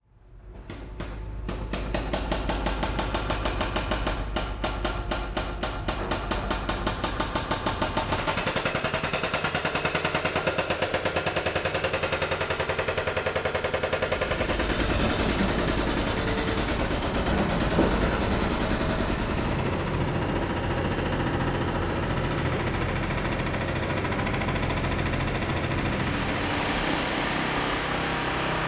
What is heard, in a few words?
construction sound fx